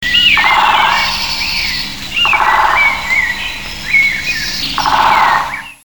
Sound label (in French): pic noir, chant